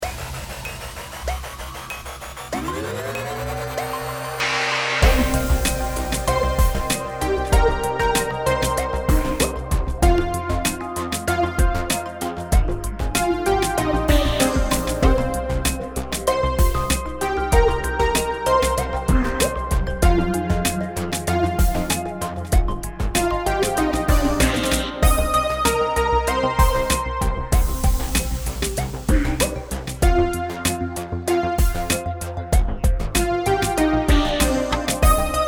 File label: Kids Game Won; Kahoot